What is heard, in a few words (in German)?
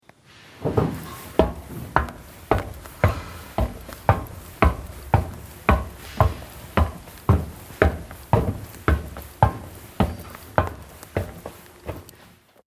Rolltreppe steigen